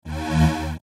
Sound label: Rollover Beep Tone Multimedia; Web Interactive; Beeps; Technology; Blastwave